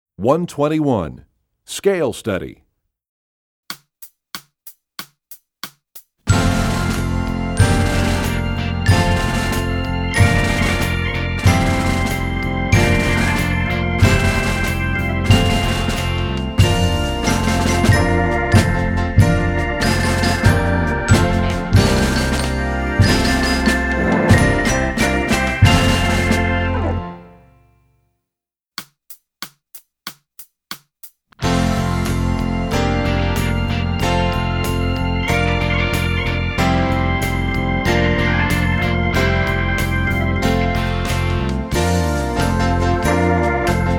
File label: Scale Study, Percussion; EE2000 Book2